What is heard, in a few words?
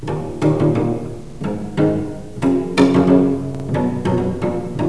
col legno, cello